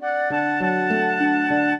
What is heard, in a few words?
trio, flute harp; Mozart